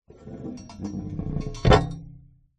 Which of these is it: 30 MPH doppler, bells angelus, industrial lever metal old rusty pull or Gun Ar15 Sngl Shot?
industrial lever metal old rusty pull